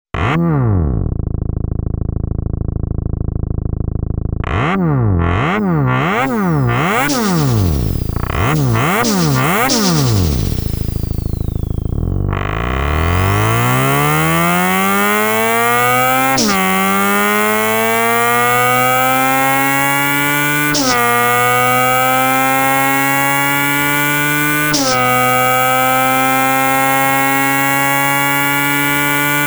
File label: open exhaust